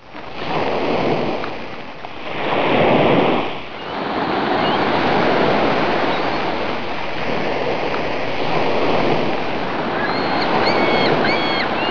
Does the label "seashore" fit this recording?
Yes